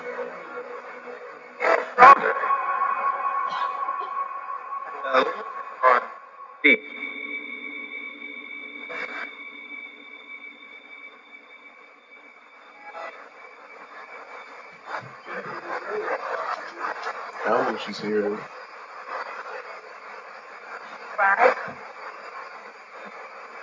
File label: Library Spirit Box